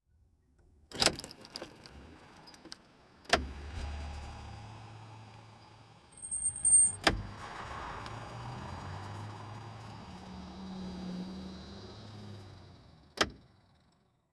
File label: opening automatically car, automated